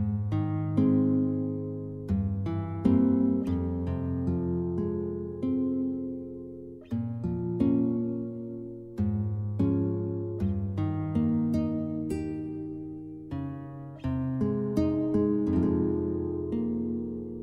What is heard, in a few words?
Hymne, piano